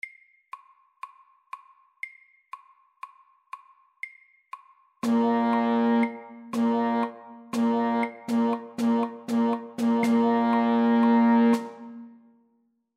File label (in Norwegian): Rytme 4 slag i takten Etterslag FORT